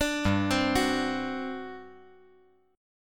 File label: G Major 7th Suspended 4th, G, down